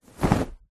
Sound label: foley blanket shake single flap